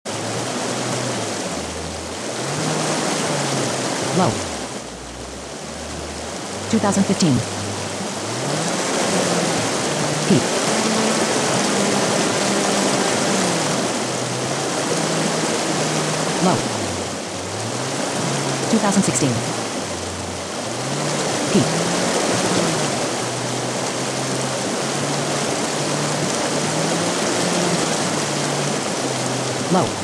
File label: AMOC Water Flow Sonification; x Axis Year y Axis Annual High Low